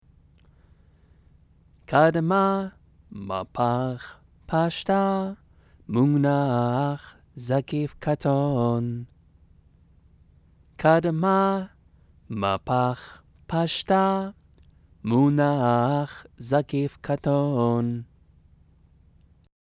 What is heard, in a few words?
megillah; trope